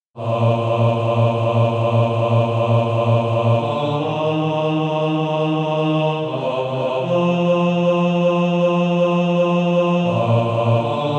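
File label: bass, Practise Music; Coming Home to Thee; SATB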